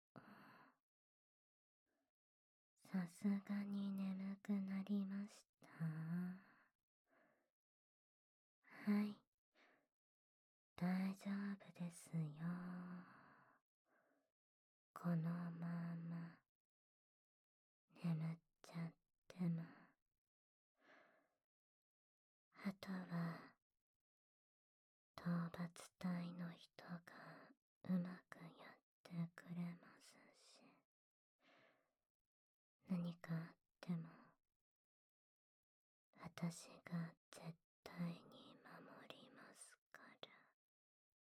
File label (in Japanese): 添い寝 寝息音声, 環境音無し; 愛してます。私の、旦那様